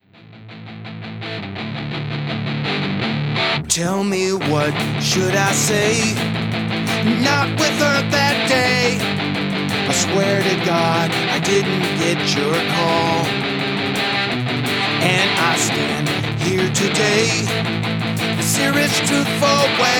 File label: Pop Song